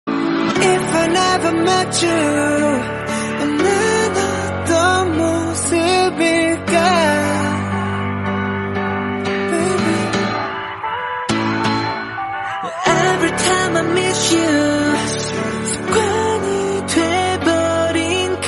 korean song

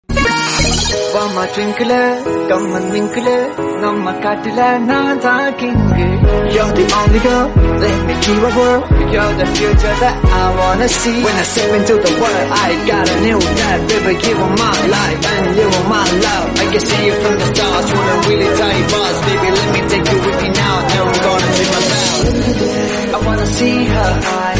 english cover song; Maari

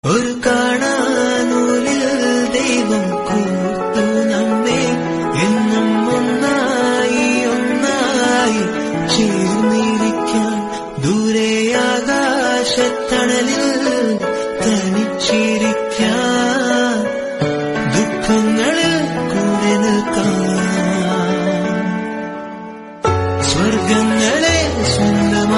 song

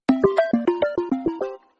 snapchat old tone

